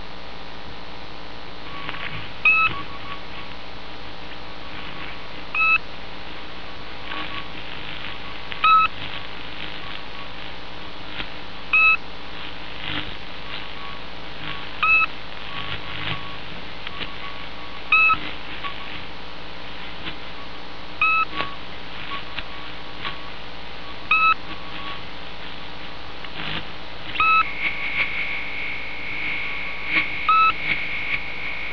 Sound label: buzzer